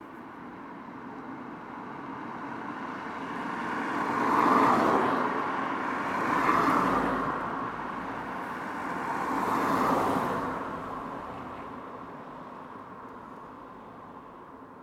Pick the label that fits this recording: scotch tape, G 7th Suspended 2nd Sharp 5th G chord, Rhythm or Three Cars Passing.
Three Cars Passing